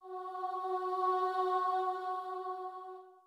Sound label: starting note, voice; the doubter